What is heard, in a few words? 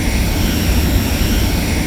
boosted rocket; Drunken Robot Pornography; Inago Rage